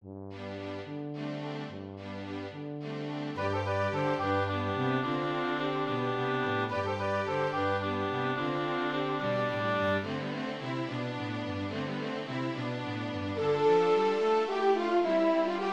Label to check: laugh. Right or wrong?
Wrong